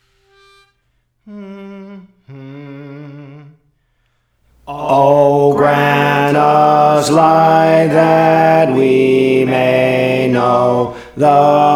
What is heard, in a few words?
Alto; Praise For The Lord Training